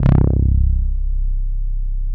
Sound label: DS8 C 1, Korg; Back In Time Records; Korg Universe VOL-1